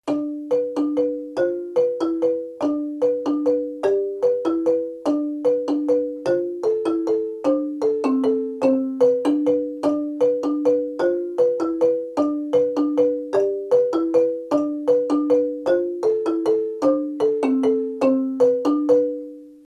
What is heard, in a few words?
lead low muchongoyo, marimba; kare mugomba